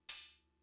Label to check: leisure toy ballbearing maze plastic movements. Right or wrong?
Wrong